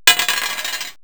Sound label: DICE